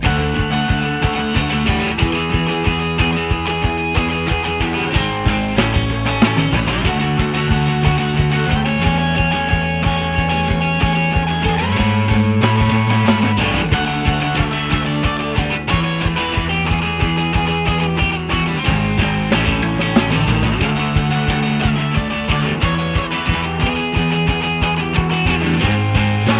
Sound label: Waltz